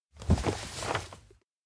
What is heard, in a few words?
bookshelf take book; anemoi